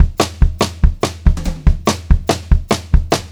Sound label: 144 SPEED, Pop-Rock Drumloops